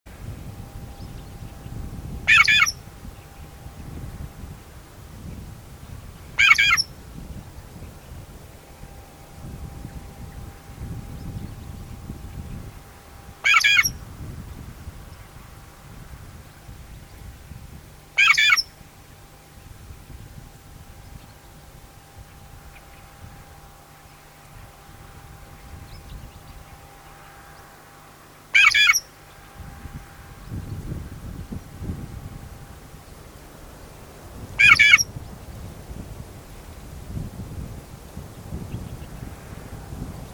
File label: Shrike Sound